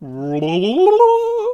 Healer Attack Vocals

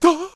Healer Death Vocals

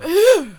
Death Vocals; Flintlock

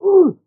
Executioner Attack Vocals

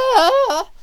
Snake Archer Attack Vocals

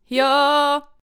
Celestial Aegis Attack Vocals

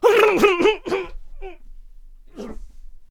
Clubber Death Vocals

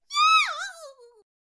Cheerleader Death Vocals

